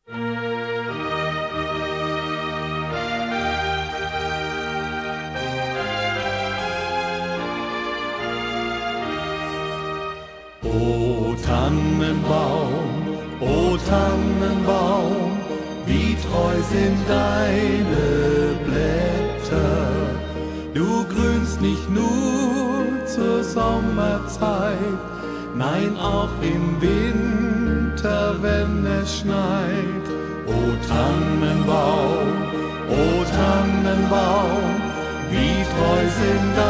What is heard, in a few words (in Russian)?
Немецкая Рождественская Песня; O Tannenbaum, O Tannenbaum